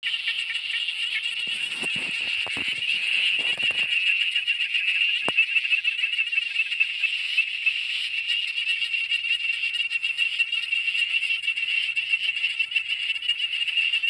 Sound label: Geocrinia victoriana; Park Orchards